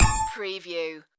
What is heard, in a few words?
game menu select